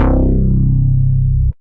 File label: Bass Synth; Empire